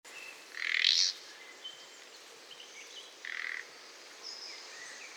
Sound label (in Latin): Luscinia megarhynchos; SETE